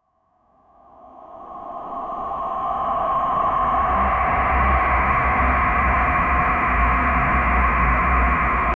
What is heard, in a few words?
apparition